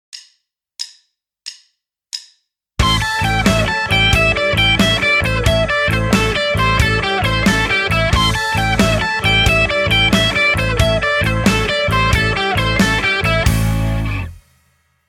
Alt picking blues lick; 30 day guitar challenge

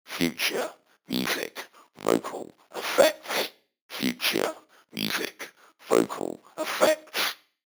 Vocal with Bitspeek

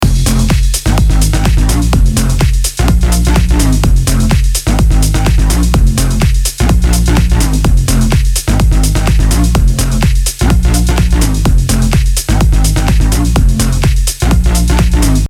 Loop with no kick low cut

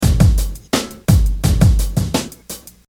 Beat 85, hip hop loops

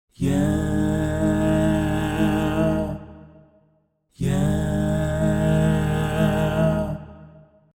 Harmony mix, vocal

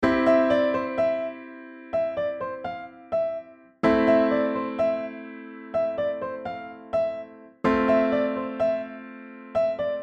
Extending a Progression

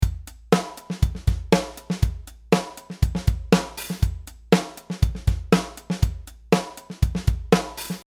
Varied velocity

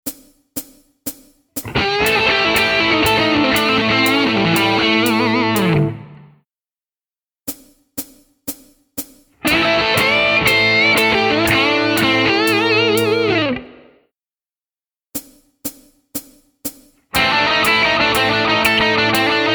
rock licks, guitar; 50 rock licks; Guitar Techniques

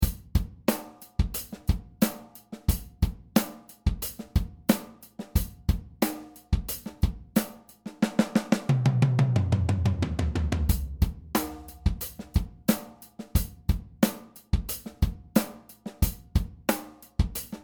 Machinegun fill

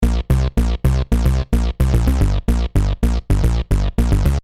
Bass 110 C; bass boutique; MatrixBass

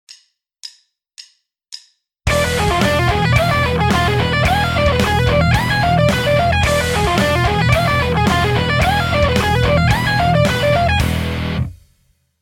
shred lick; 30 day guitar challenge

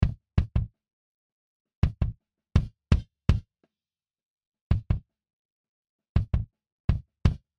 Gate, acoustic; enhance acoustic

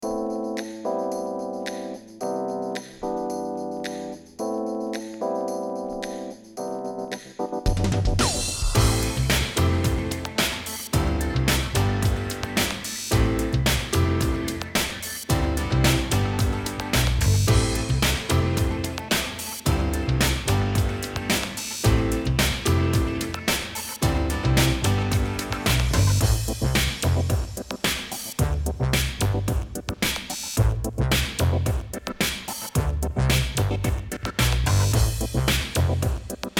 Master EQ matched to commercial track

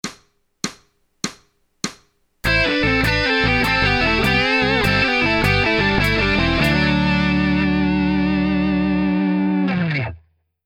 Harmonies easy; Guitare; Les plans de gratte

